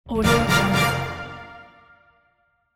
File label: reward; medusamusiclab